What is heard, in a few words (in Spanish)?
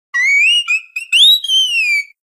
Grito de Oricorio estilo plácido